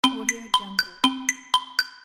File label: game time countdown loop